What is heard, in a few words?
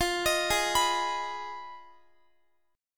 F Minor 7th Flat 5th, Guitar; Fm7b5; arpeggiated